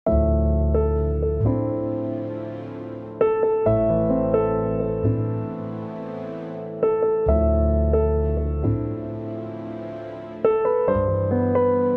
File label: Spacedrum; morphing; pianoteq8